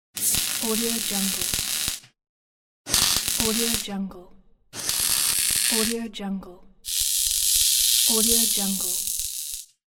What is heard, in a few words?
welding